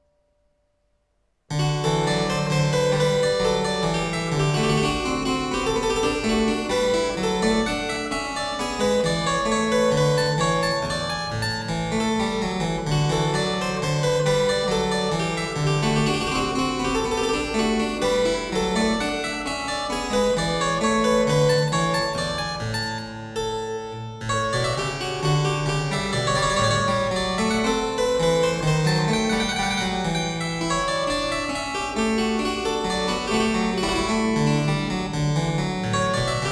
French Suite No. 4 in E flat major, BWV 815 - V. Air; Bach; The French Suites